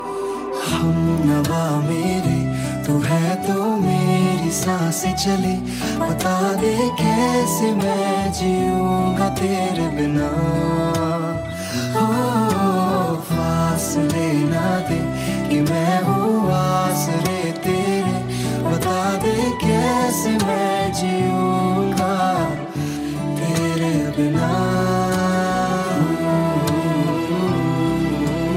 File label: new bollywood ringtone